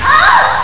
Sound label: ahhhaa